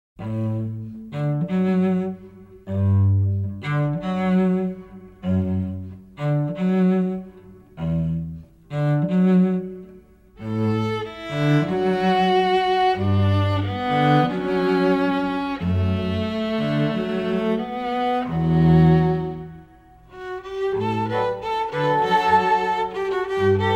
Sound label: Instrumental